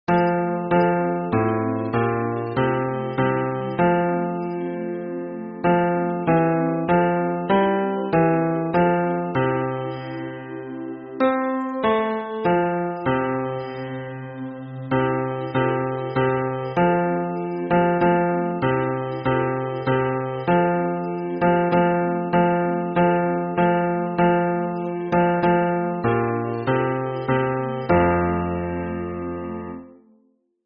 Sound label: hymn bass